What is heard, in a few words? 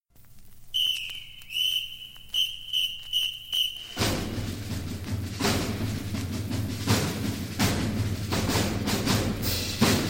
Fanfare And Fight Song; Westland